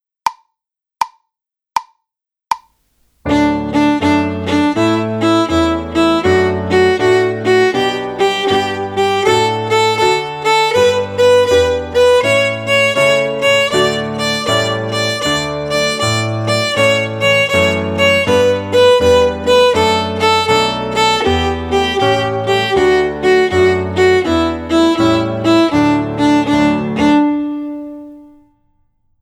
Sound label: D Major Scale 6/8 jig